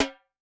Latin rim, Yamaha DTXpress-II snare

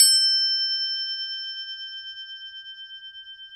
Crotales, Orchestral Perc; Roland LCDP03 Orchestral Perc

